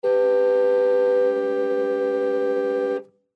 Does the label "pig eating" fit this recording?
No